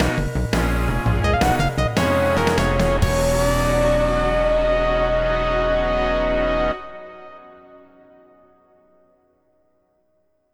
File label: fanfare lost